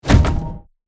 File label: Fantasy rpg game menu ui tab switch